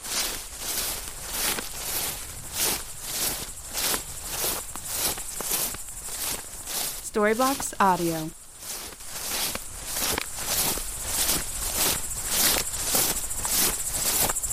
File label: tall grass walking